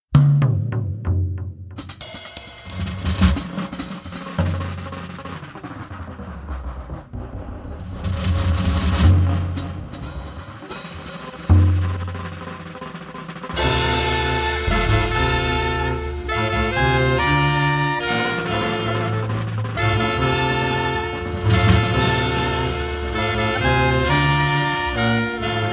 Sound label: Fanfare